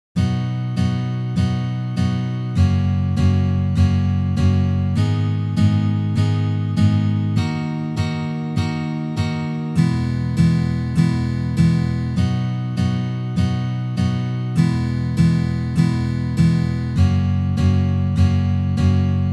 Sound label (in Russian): Uprazhneniya dlya igry akkorda Si mazhor na gitare